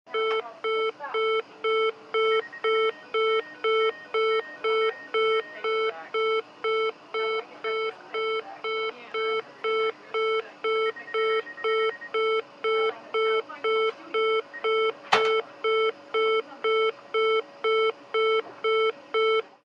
HOSPITAL EQUIPMENT HOSPITAL: Intensive care unit, oxygen saturation alarm, with background.